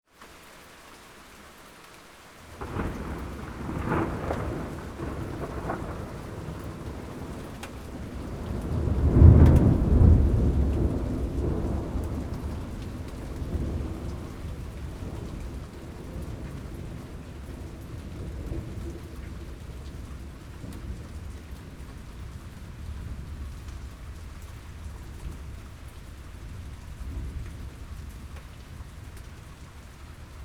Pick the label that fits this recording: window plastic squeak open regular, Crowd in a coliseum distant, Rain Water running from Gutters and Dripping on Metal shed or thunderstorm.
thunderstorm